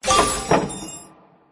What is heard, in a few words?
Harvest Wood; the great ottomans strategy battle for throne android